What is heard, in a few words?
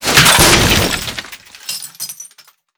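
destroy pinball impact; Explosions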